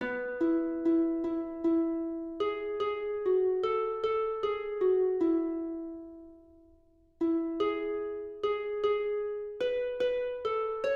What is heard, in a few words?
eagle chief melody